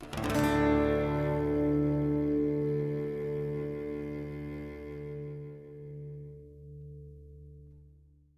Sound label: Stinger Gate; assassin s creed chronicles india; Amritsar